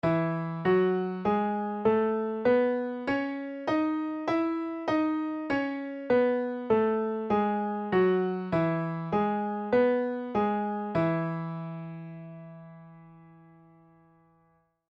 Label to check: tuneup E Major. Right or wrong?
Right